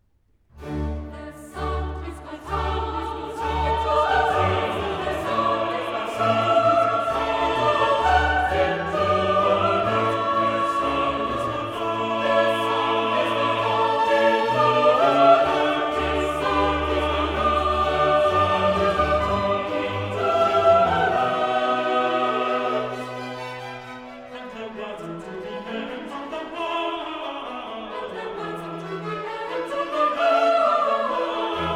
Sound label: Chorus; Their Sound is gone out into all Lands; Handel; Messiah